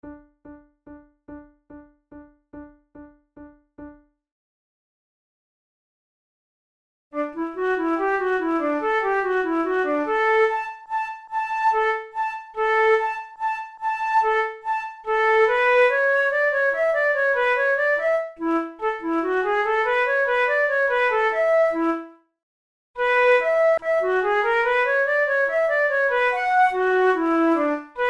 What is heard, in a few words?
in d major, flute